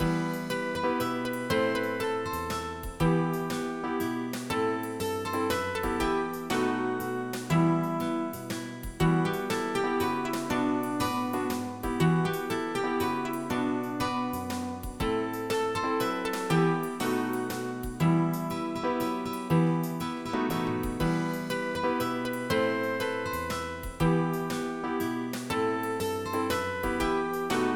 tune; 40 Best Windows 95 Games; SoftKey; TLC Properties

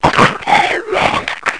WOLF

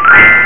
heal; Theldrow

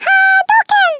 fireball; Swedish

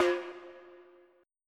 beep; MIDI Music File; Dave Lowe; Street Fighter 2 PC

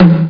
TOM; CDTV Lemmings

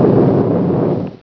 figure Eight Flyer Die; F.A.R.M. Patrol

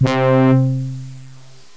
Orchestra

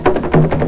african drum, percussion instruments; Sound Effects for Multimedia - Volume 1

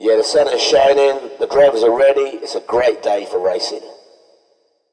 sunny shine

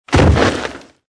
Drop Heavy On Ground; Survivor The Interactive Game

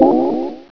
Op Button; Homer

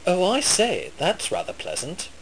ouch; 3D Klondike 1.0a

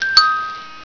ping pong

